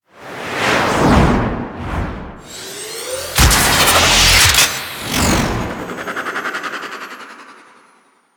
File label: Grineer Ship Extraction Archwing Release; Liset; Tenno